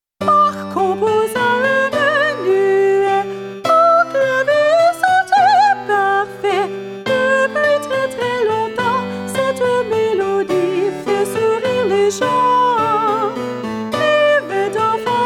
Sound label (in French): Menuet en sol majeur vocale